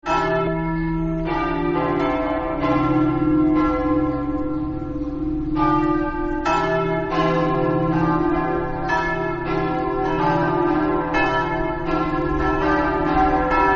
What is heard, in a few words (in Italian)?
campane